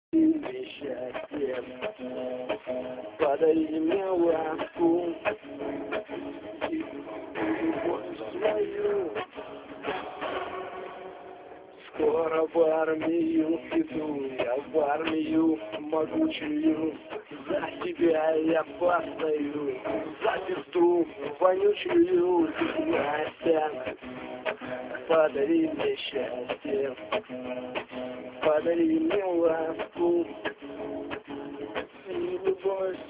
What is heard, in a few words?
gitara